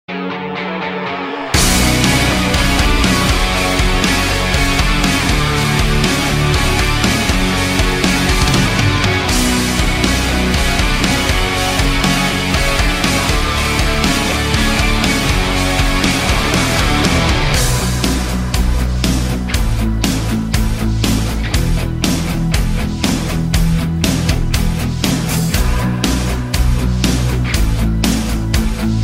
Instrumental

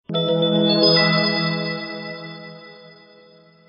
bells msg; ringtones

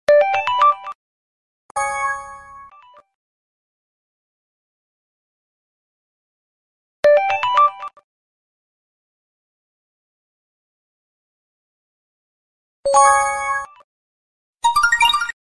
simple Tone

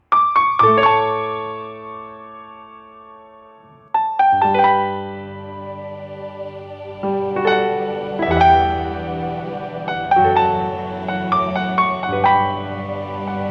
pianooo; children

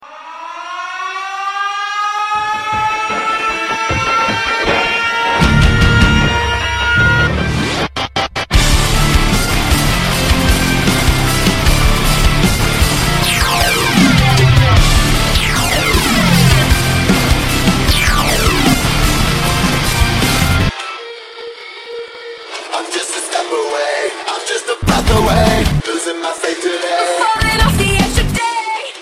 sms mix

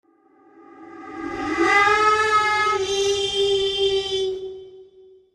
horror sound effects; ringtones; sms

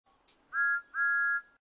whistle; trainborn

